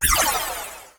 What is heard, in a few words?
Beamup; Nickelodeon - Capture The Slime